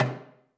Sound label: DARBUKA, AFRICAN DARBUKA